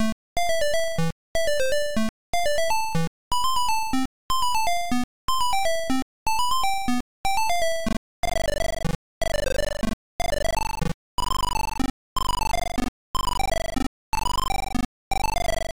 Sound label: Beeper